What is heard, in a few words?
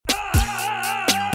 ahhh